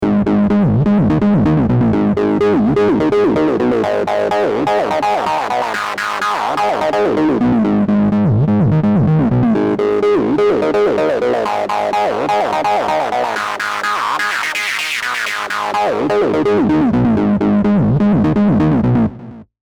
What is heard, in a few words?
basic acid